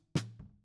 SNR Top

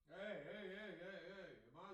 Piano A

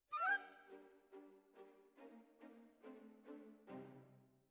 Flute

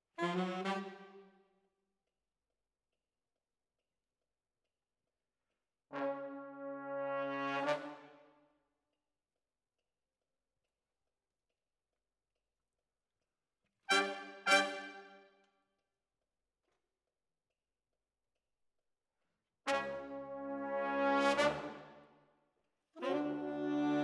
Brass Over

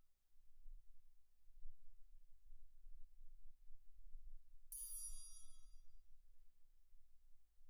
Perc

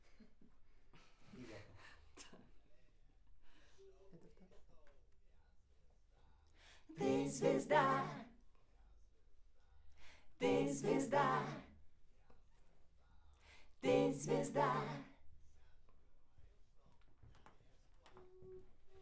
Backs AKG LEFT

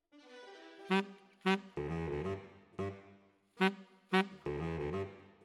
Tenor, RNR for piano

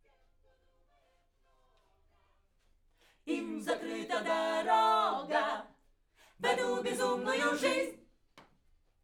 Backs; SCHOEPS

